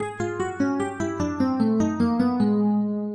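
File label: 12-tone row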